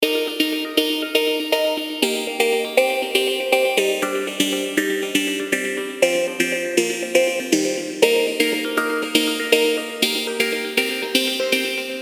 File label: formant fairies